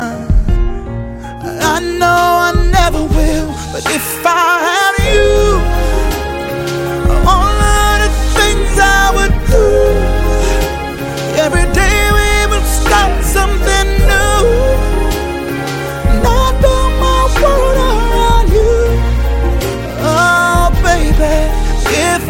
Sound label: Cool Slow Song